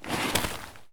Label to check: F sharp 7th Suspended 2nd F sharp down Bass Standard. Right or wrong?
Wrong